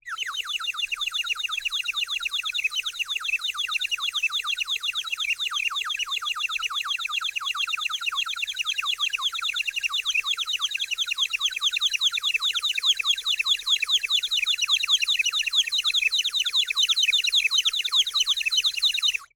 Birdwhistle, clay, painted, water, airy, wispy, constant, rapid, smooth buildup, slow crescendo, long; Eiravaein Works; Vaeyan IV